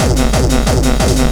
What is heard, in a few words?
drum loop